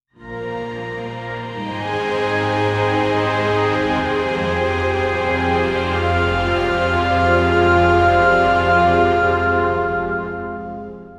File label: hopeful orchestral swell, emotional